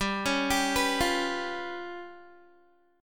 G 7th Flat 5th G, Guitar; arpeggiated; 15,16,17,16,17,x; 4E,4A,5D,5G,5C,6F

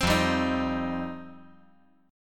F 7th Suspended 2nd F down

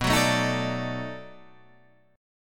B 7th Sharp 9th, down, Guitar, Half Step Down

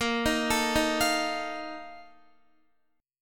A sharp Major 7th Suspended 4th, down, Guitar; arpeggiated

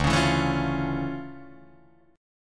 D Minor Major 9th D down Guitar Open D

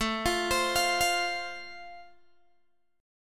A Minor Sharp 5th A Guitar DADGAD, chord

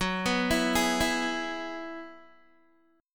Gb 7th Suspended 2nd Suspended 4th, Guitar

